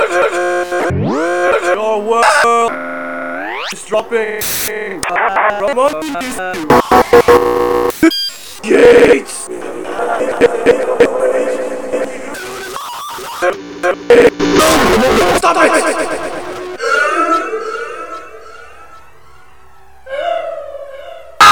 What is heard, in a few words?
Mental glitch out